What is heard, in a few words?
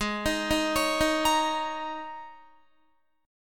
Ab Suspended 2nd Suspended 4th, down, Guitar; Absus2sus4; arpeggiated; 4F,4A,5C,5G,5C,6E; chordshape